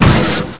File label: Hit; XWINGTIE